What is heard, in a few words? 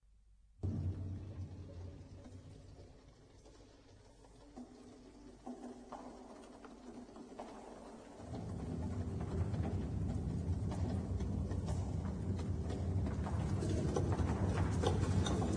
modem